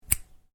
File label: Scissors snip, Scissors: Short Hard Snip; Household; Miscellaneous Household Sound Effects; Miscellaneous Household (Sound Effects:Household); Stuart Duffield